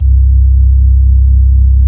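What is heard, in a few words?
B-3, Hammond B-3 organ; Roland LCDP10 Keys of the 60s and 70s 2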